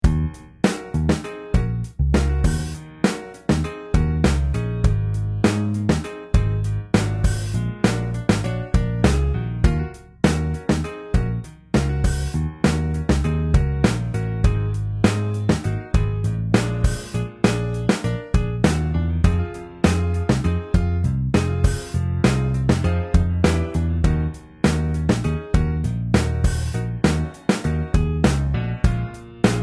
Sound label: Funky Rhythm